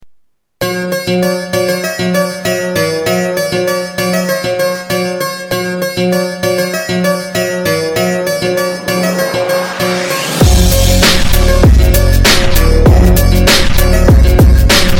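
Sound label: Track Basses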